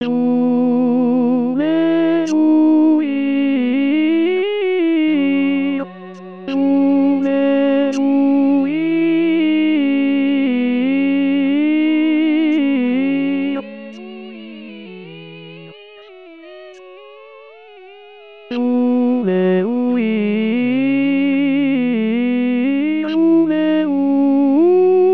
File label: tenor